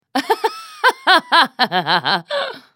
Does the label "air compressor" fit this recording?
No